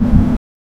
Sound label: generic car hit sound; accidents